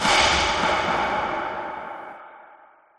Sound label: Stinger; Apartment Complex - Mannequins (Stinger 2); Condemned 2: Bloodshot